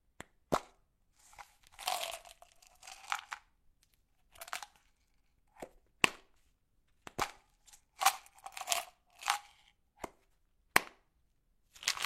Pill bottle

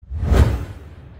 wind change slide

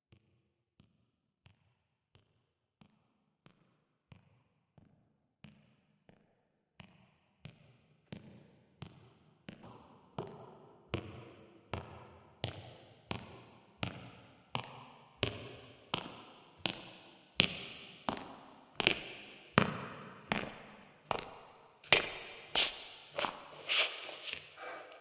Approaching Running; human; footstep

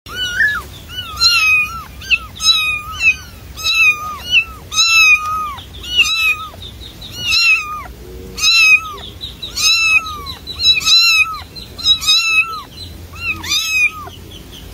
Kitten play; صدای پیشی

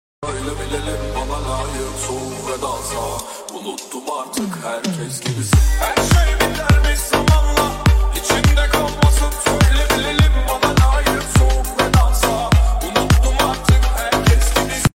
remix bass

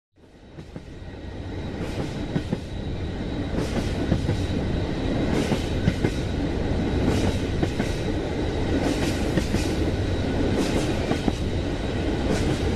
train on tracks moving

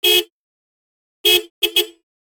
Car beep

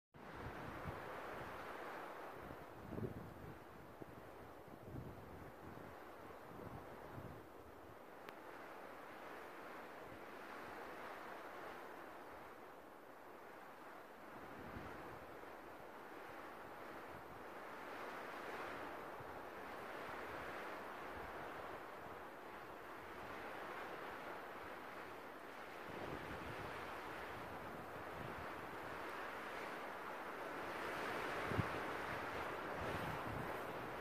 Forest Winds; nature

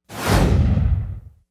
change slide sound

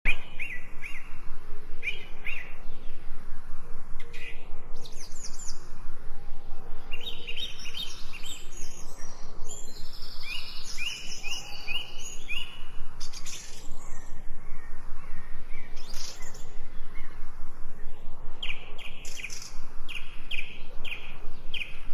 3D forest

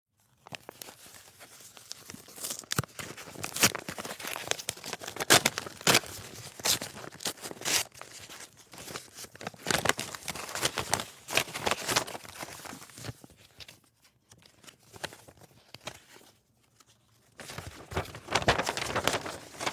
letter openning; objects

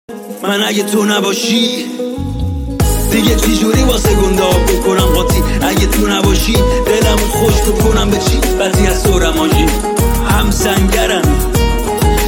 Remix

